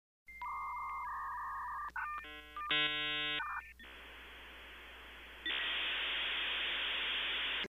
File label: dialup